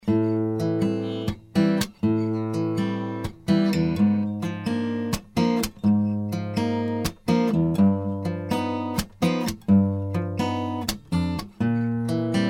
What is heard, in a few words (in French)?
extrait guitare